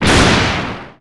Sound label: thunder